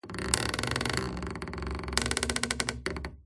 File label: Stress Creak Squeak Groan; PMSFX